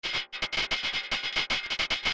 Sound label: noiz